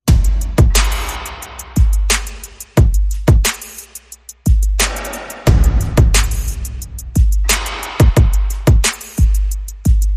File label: Perc